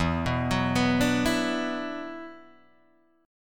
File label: E 7th Suspended 2nd, down, Guitar, Open D